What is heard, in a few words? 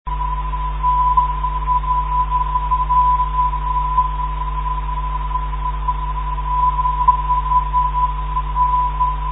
434khz, ndb